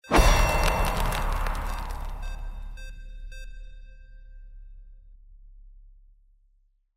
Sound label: SCIFI MAGIC ICE RUNE; windy beach audio